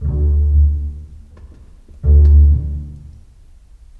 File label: Strings; Sonic Foundry (Sony Creative Software); Orchestral Series VOL-4; Rock and Pop; Rock-Pop 14